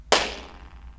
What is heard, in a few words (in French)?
echo flo